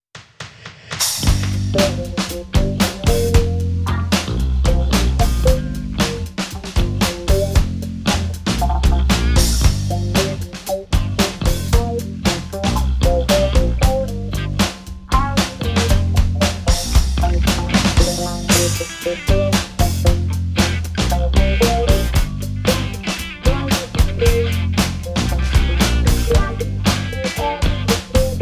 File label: Frogfish (Instrumental Demo)